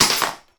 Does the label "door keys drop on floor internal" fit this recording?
Yes